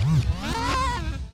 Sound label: backspin, Vinyl